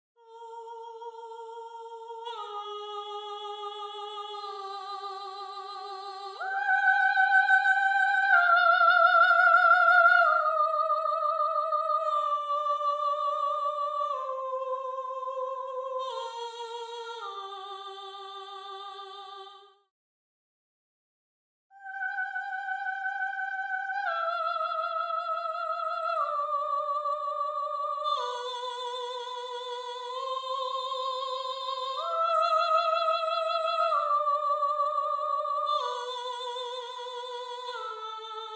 Soprano, counterpoint

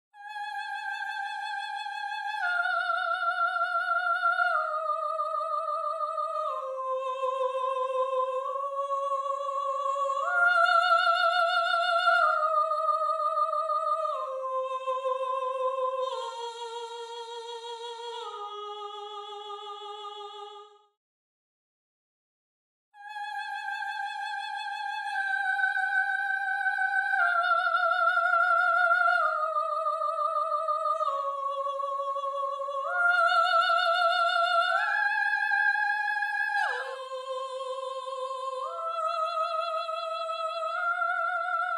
Soprano

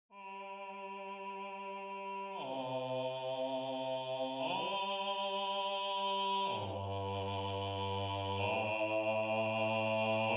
Bass

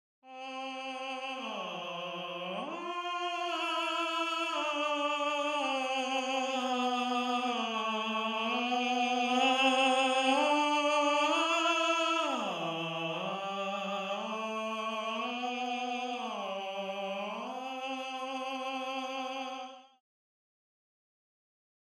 Tenor

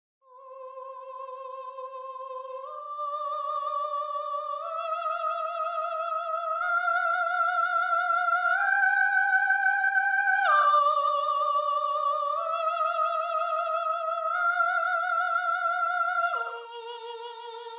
Soprano

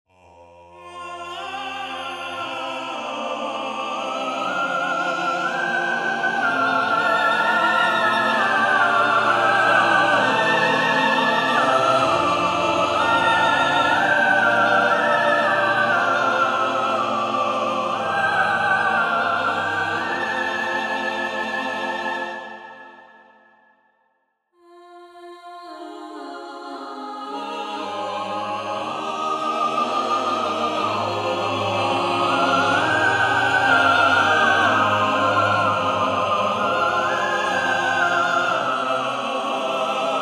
counterpoint; Artinfuser Counterpoint

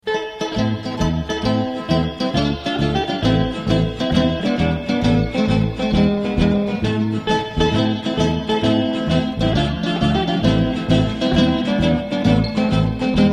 Tarantella Napoletana

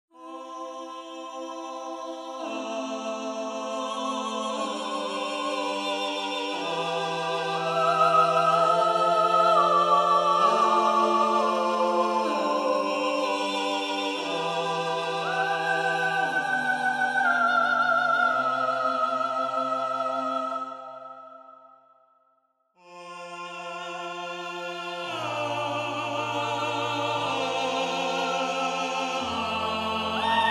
counterpoint; Artinfuser Counterpoint

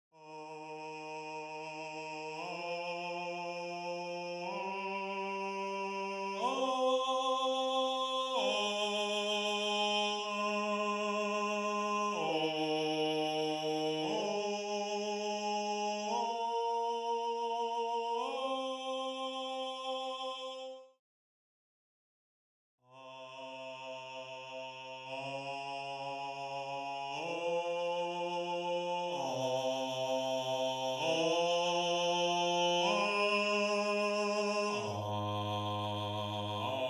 Bass

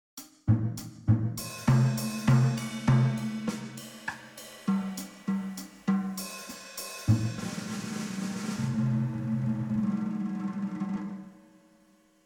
jazz drums

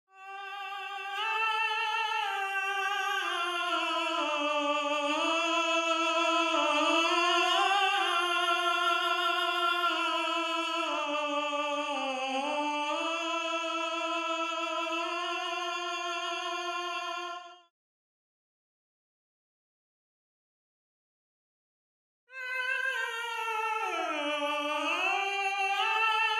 Tenor, counterpoint

